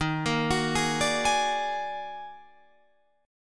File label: D sharp Minor Major 11th D sharp, Guitar Open F; arpeggiated